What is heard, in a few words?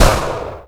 mini cannon; weapon